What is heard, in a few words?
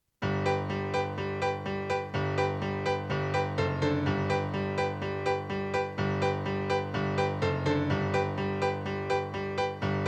piano